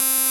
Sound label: SYN RESONA, dance synth; Sound & Vision - Gigapack I CD 2 (Roland)